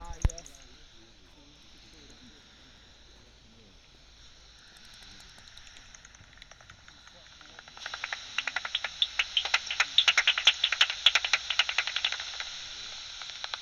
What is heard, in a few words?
bats